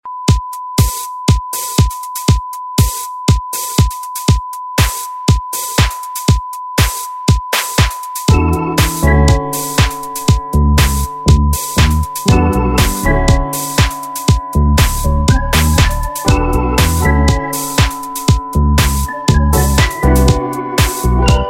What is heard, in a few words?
smooth melody house